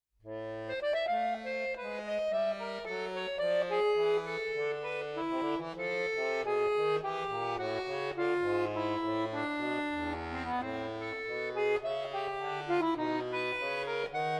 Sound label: Prelude in A minor